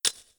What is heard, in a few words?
Reel Stop; genesis; euro Golden Cup